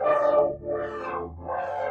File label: SYNTH PAD ETHNO 125 A, Sphere